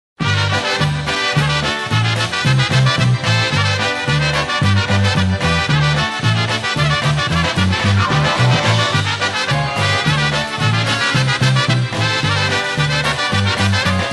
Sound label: Christmas Polka, Push Style